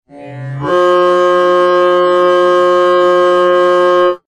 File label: brrrr long